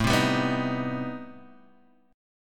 C Minor Major 7th, A, chord

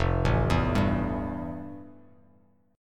F Major 9th F; FM9 arpeggiated; JGuitar

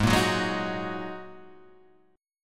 G sharp 7th Suspended 2nd, Guitar, Half Step Down

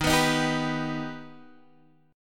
Eb Augmented, Guitar Drop D; down

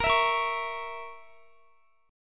A sharp Diminished Guitar, chord; A#dim; strummed; x,x,20,21,22,20

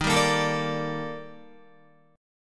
D sharp Major Sharp 11th, Guitar; strummed; JGuitar

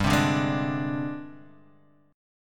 G sharp Major 7th Flat 5th

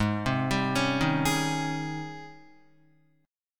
G sharp Major 11th G sharp, Guitar; 4,3,5,6,3,3; JGuitar

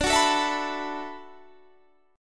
D 6th Add 9th D, Guitar, Standard; D6add9 strummed; 22,21,19,21,22,19; JGuitar

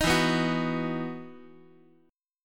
E Major 7th Suspended 2nd, Guitar; EM7sus2 strummed

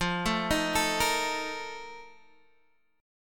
F 11th F, down, Guitar Open G

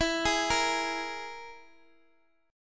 E-Major Flat 5th E, Guitar DADGAD

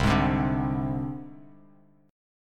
C Suspended 2nd Sharp 5th, down, Bass Standard; Csus2#5 strummed; JGuitar

